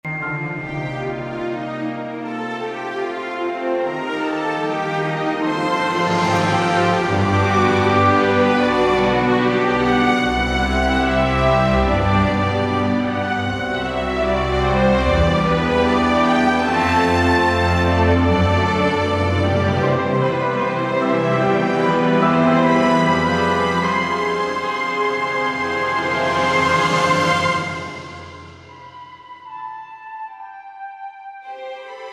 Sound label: Romantic 76BPM; mixology vol 1